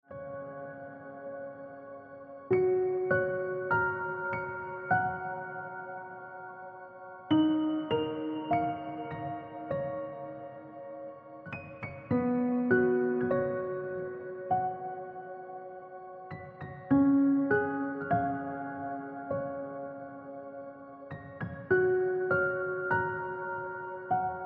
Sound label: Piano Music